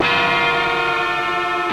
Aggro Stab; Outdoors Old

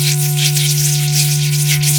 Mega Beast Core Egg Charging

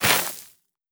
run ground stop

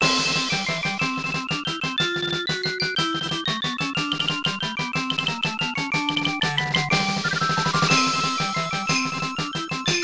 Tiny Fanfare; Liquid Kids; arcade

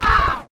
Monster Squawk

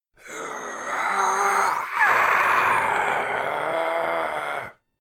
Cultist Door

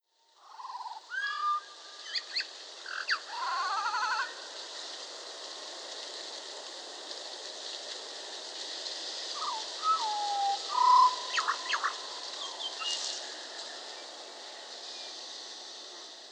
Ambience critters

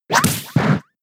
Tail Slap; attack moves; Pokemon; gen 6; XY; ORAS; Tail Slap 1hit

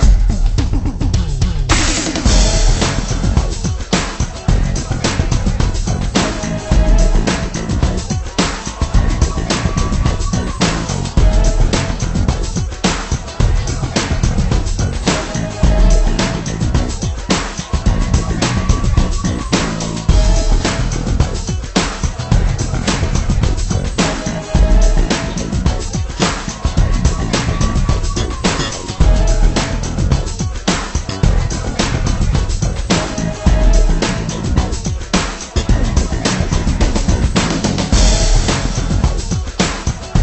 Menu Loop; Tiger Woods 99 PGA Tour Golf; PS1

